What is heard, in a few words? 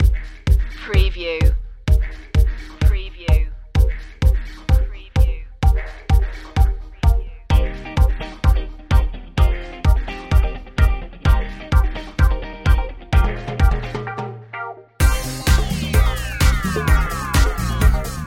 pop city loop